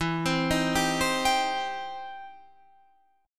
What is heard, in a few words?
E Minor Major 7th Sharp 5th, E, down, Guitar, Standard; EmM7#5 arpeggiated